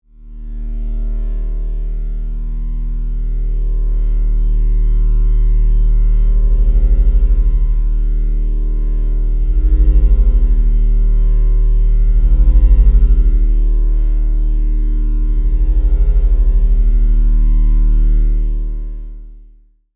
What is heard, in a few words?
Electrical Hum, Scifi, Electrical, Hum; Waver, Science, Fiction